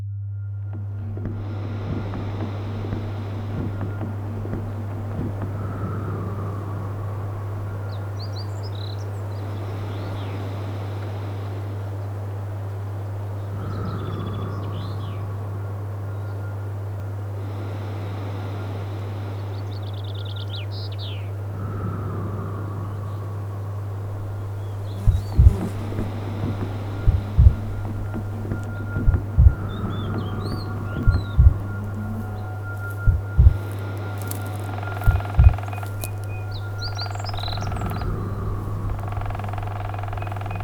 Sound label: the sound of swedish forest; Listen to the sound of the Swedish forest; La Biennale di Venezia